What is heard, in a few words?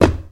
glass land; Golden Crayon Sounds